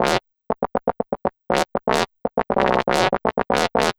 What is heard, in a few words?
Percussive